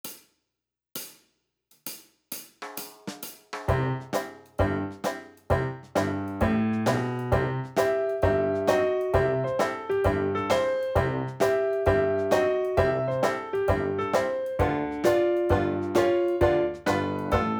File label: Banjo Dixieland